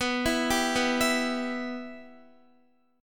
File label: B Suspended 4th Sharp 5th B Guitar; Bsus4#5; arpeggiated